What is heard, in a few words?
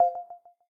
allshare disconnect; Samsung Galaxy S8; WfdBroker